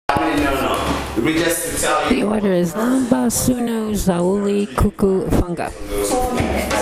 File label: ORDER OF DRUM BEATS - LAMBA SUNU ZAOULI KUKU FUNGA, DRUMMING GROUP